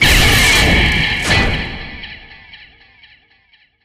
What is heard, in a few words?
re spin hit